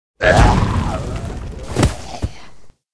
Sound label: death, monster; mutroop